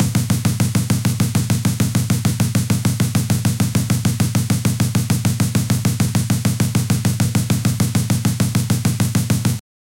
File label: drum roll with increasing, increasing intensity